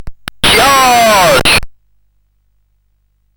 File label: CSG8580R5, GAMES; Slapshot